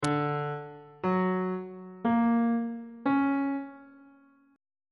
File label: c double sharp augmented major 7th chord intervals on bass clef